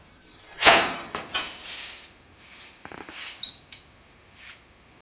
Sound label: soft metallic rattle